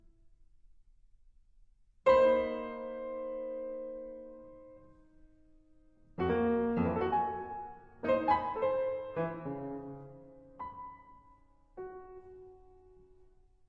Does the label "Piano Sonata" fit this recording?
Yes